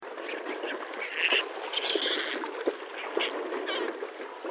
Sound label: Procellaria aequinoctialis - Petrel